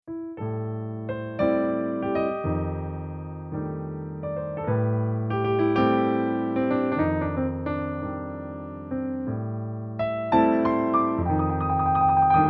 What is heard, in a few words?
Blues eyes blues